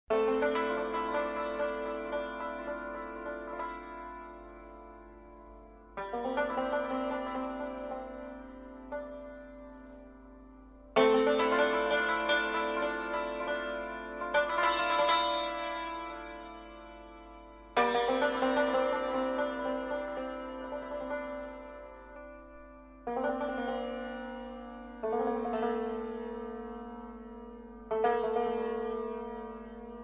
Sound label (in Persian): Taknavazi Santoor